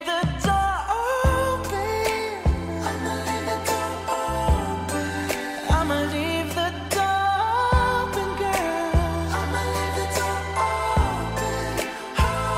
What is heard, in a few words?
English song ringtone